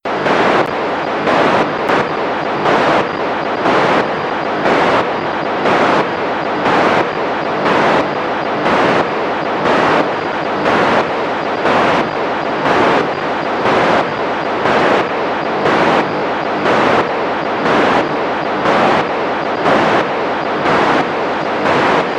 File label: orbcomm fm08 satellite 137mhz800; signal from ORBCOMM FM-08 was received on February 15th 2014 at 13:00 UTC on 137.800 MHz in FM